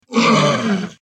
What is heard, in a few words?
zombie; CityHero